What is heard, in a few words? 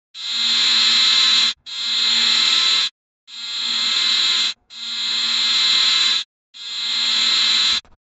droid robot sound, glitch